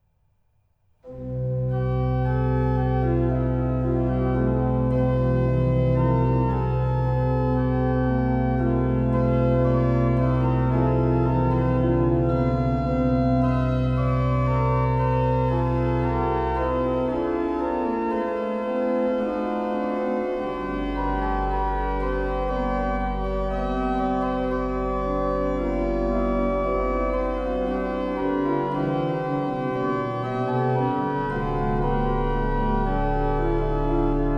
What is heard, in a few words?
Ariadne Musica Praeludium et Fuga XIX, Organ Music from Bohemia; J. C. F. Fischer